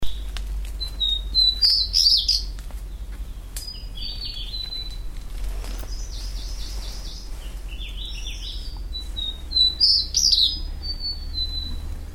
redstart white bellied Luscinia phoenicuroides

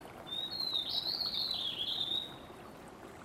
wren-babbler chinese Pnoepyga mutica

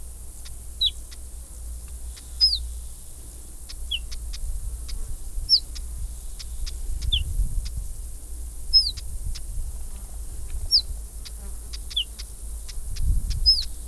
bunting ortolan Emberiza hortulana

